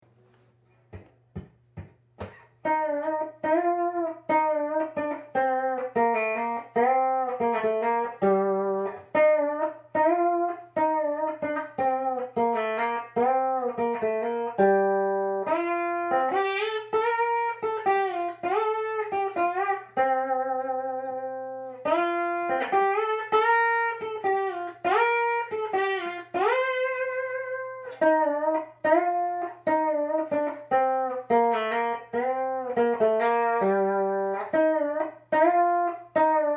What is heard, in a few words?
Melody